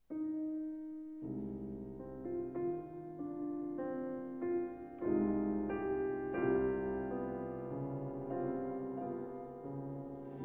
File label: Two Pieces Cello Love Song